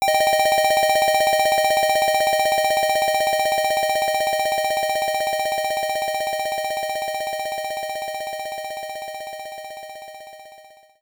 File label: Alarm; Photo Clock - Alarm 1; Nintendo DSi